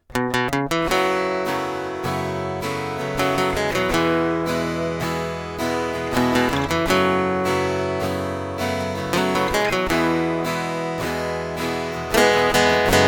crafter castaway piezo

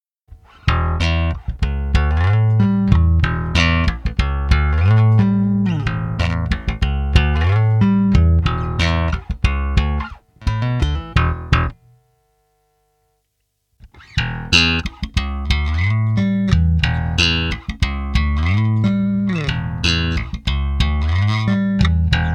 slap; Jazz Bass

